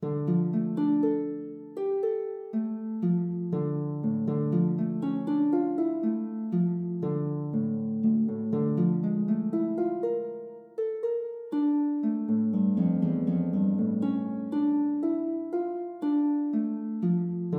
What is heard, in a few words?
Prelude, lute